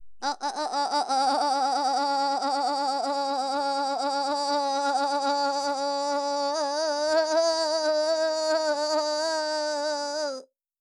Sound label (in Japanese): あ、あ、あ、あ、, ノーマルマイク